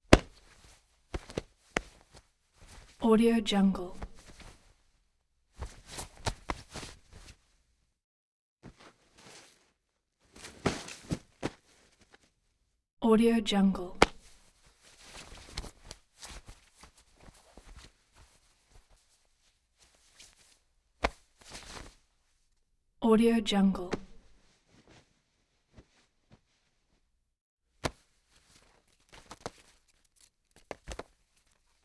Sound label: fight grab various body impacts struggling